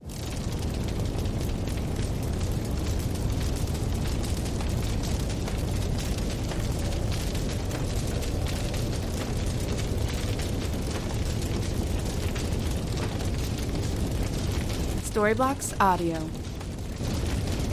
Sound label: flames heavy crackle